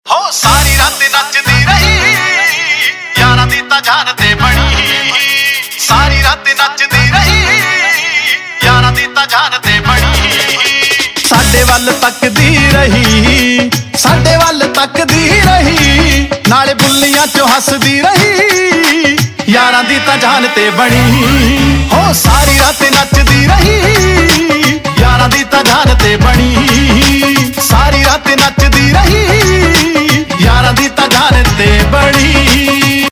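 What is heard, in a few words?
Ringtone, Punjabi Pop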